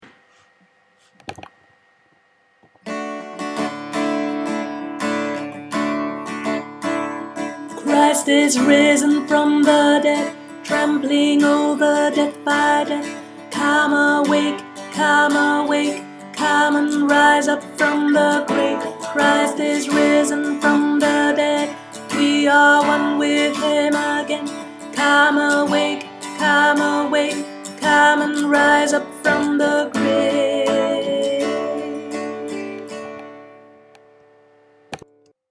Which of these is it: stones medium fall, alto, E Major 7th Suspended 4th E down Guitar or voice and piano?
alto